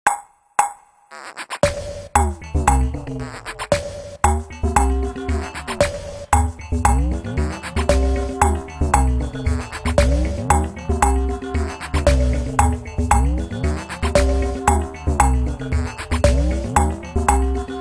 Trio; Sony Ericsson; PlusFourSix; Bella (Z780i)

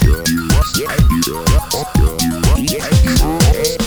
Electro, ringtones; Google; NewWave Labs

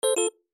Chord; TCL 10 SE

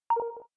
Feedback; LG GW820 eXpo

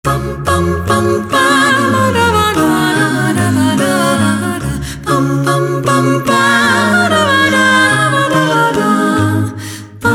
Minuet, ringtones; LG Swift L9

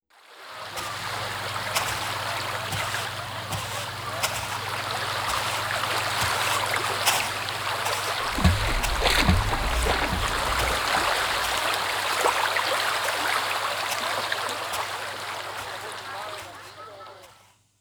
Beach II wo Perc; Sony Ericsson; C901; PlusFourSix; Lars Edvall

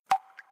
Lock; WAO

